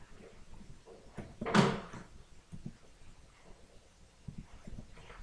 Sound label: jungle